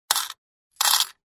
Dumping coins to the cashier

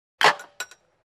Hang up the phone; telephone

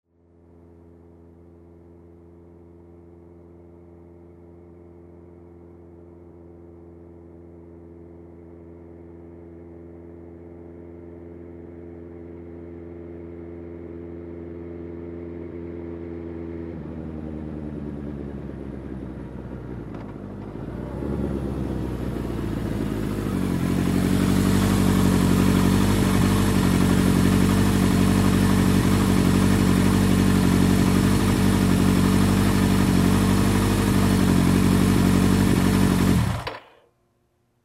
Motorcycle approaching, turning off

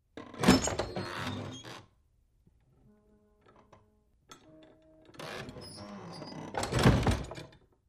wooden door opens and closes with creak

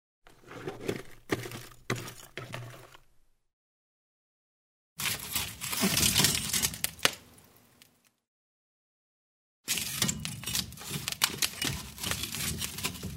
stir coal in the fireplace, make a fire

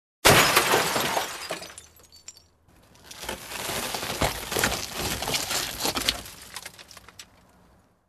Breaking the windshield of a car; glass

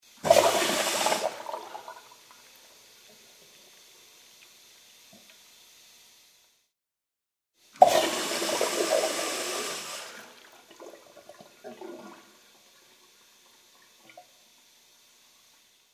Urinal flushing; toilet

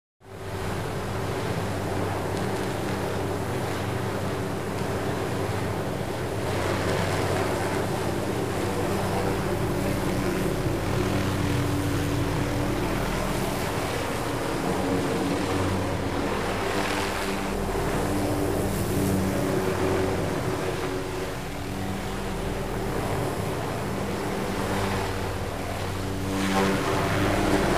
The helicopter circles in place, flies away

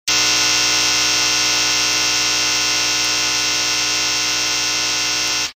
Electronic signal

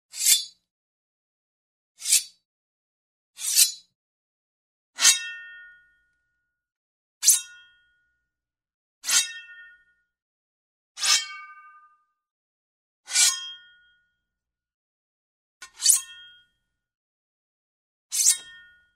Two swords clash against each other; cold weapons

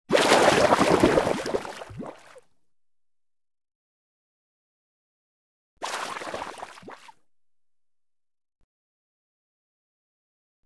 Water splash

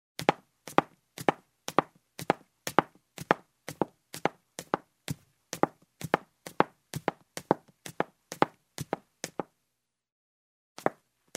Steps on a wooden ladder in heels

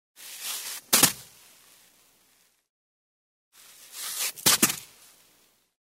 Barefoot jump in the sand; steps

